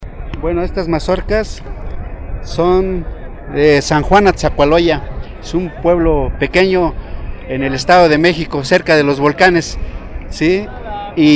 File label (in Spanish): carnaval; engendro